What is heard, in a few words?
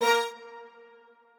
strings